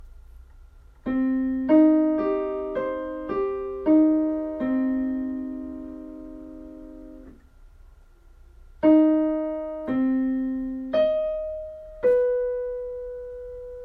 Диктант до минор

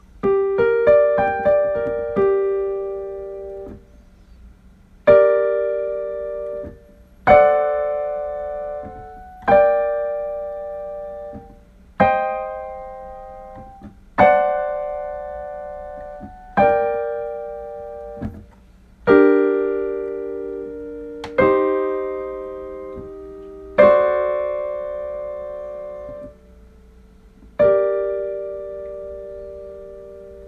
аккорды

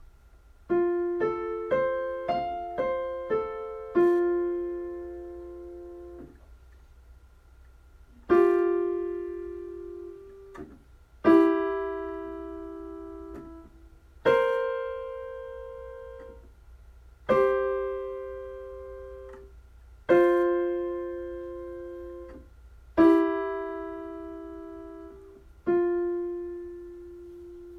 Интервалы

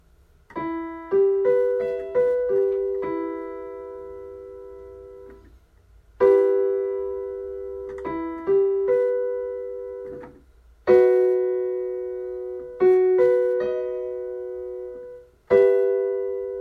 Аккорды ми минор

